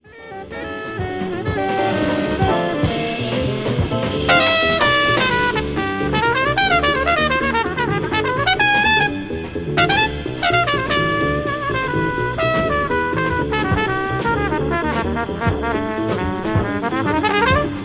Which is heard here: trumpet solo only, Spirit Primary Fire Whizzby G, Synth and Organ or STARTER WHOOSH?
trumpet solo only